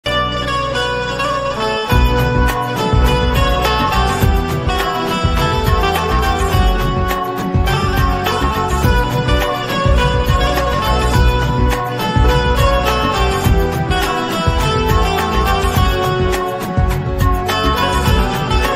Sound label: naat kalam ringtone instrumental